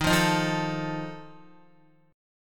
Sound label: D sharp Suspended 2nd Flat 5th D sharp, Guitar; strummed; chordshape